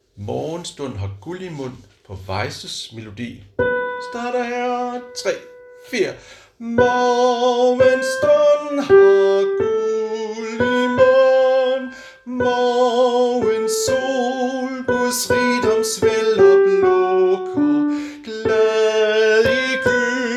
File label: sopran; harlev koret; Morgenstund; Weyse